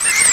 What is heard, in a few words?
Cheering loop; Mario Paint